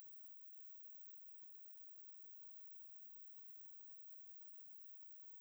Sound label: single pickup, Gas Leak; crysound; Handheld Acoustic Imager